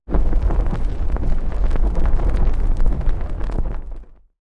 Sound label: Fire